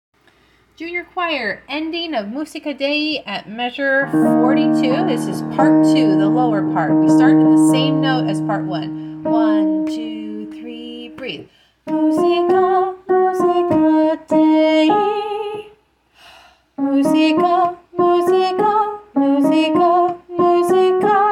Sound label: Musica ending